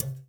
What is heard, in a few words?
TIMBA, BR TIMBALES; Spectrasonics; Liquid Grooves 1 & 2